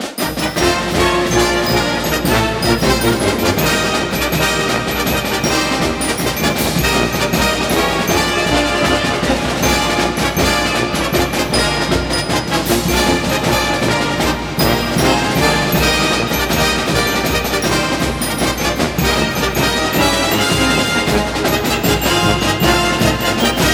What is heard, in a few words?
Broadcast Fight Song